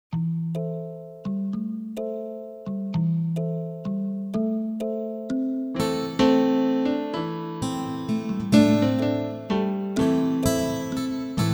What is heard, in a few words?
Waltzing Kalimba